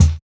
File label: Kick, jungle breaks drum sounds